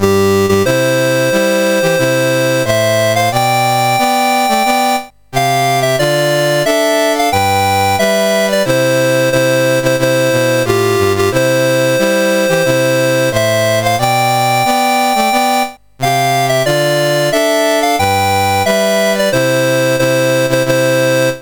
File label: Kuwait, National Anthems, CSG 8580